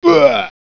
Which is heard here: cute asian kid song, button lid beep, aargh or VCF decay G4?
aargh